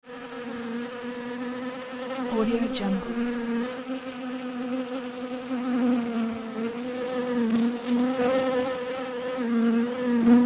bee noise

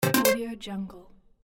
file complete

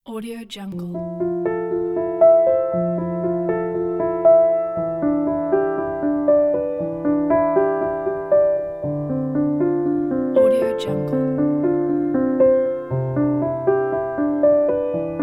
ambient piano

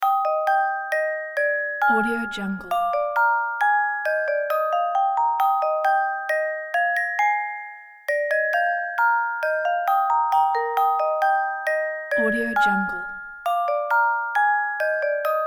children classical musicbox

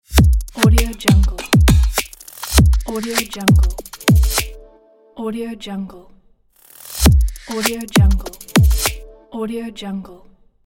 modern tech percussive logo

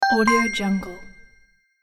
positive correct bonus alert

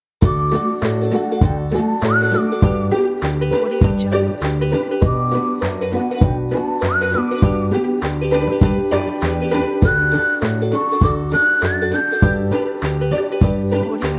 whistling ukulele logo; juicypulsemusic

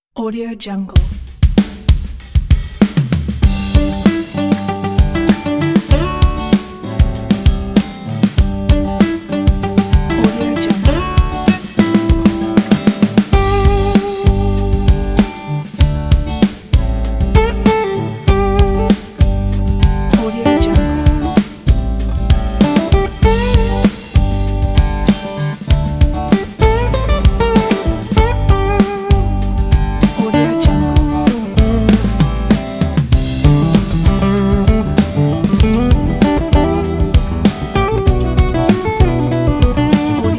uplifting acoustic pop

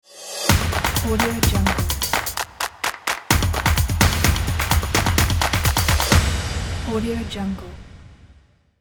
drums logo

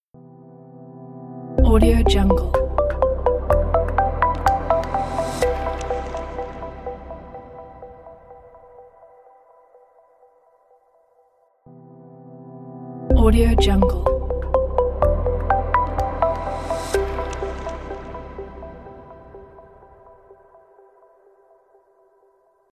tech science logo

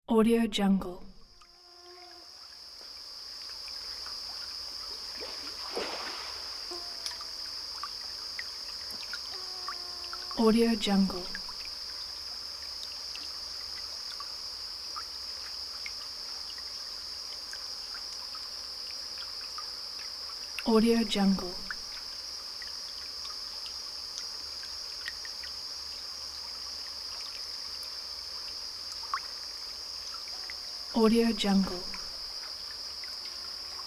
quiet river in forest; sunnyplanet

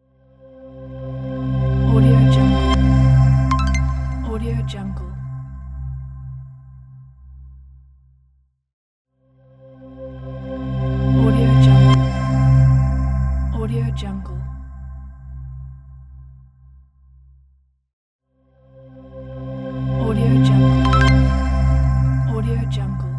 opening logo

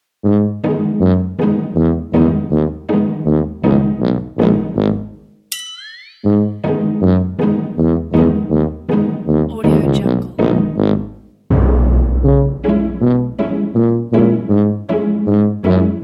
quirky

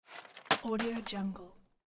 throw the paper ball

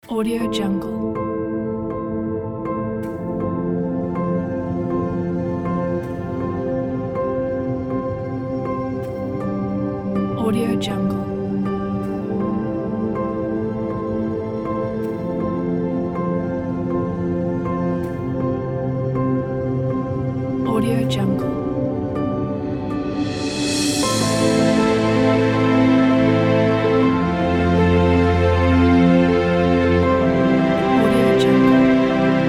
motivational uplifting cinematic

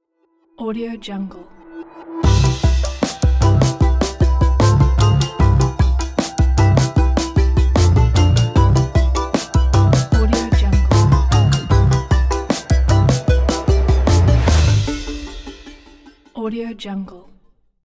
hip hop intro logo; devourer; icyvibes

